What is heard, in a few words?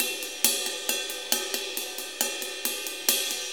4-4 Ride Loop; Drum Tools